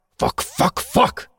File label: Arpeggiated